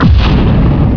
big gun

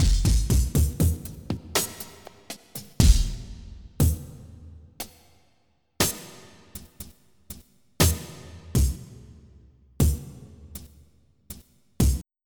Drum Loop; WS28Eval

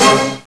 Beethoven 5; SuperMac

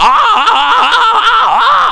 Ahhh

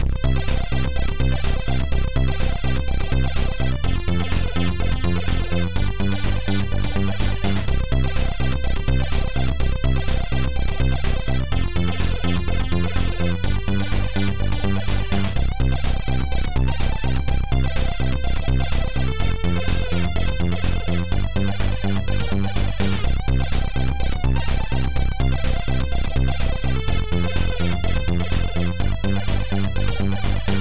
synth

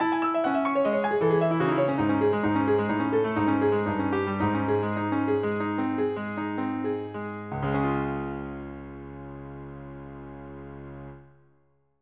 PIANO

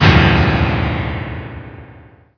Shock

